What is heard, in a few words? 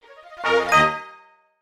Congrats